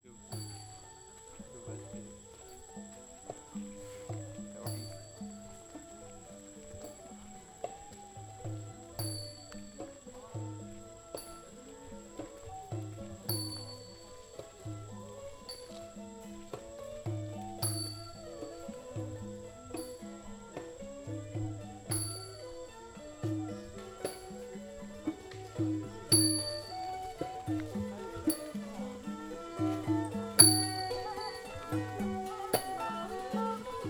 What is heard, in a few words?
temples musicians, cambodia; detached places